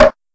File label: Wooden